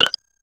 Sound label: medical injection; game sounds